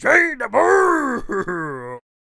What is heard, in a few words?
King Attack Vocals